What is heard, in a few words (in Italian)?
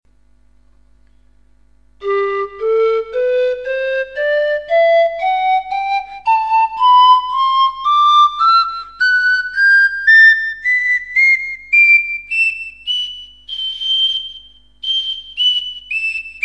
alto